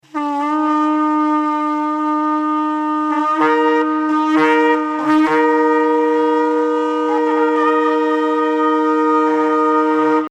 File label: double stacked shevarim